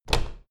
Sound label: door plastic handle pull up hard